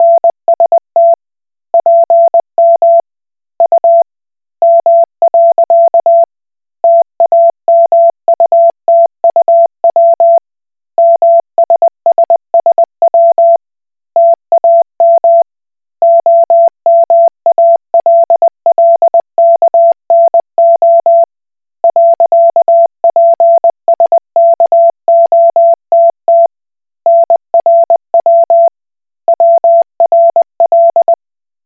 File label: Koch Morse